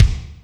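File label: kick Foot HL, Alesis D4 drum machine; 300 Drum Machines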